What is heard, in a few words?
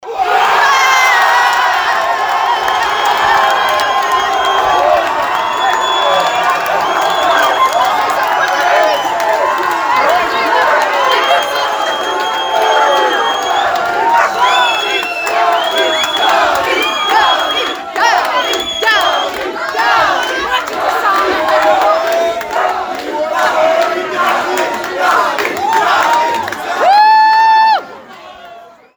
amb euro applause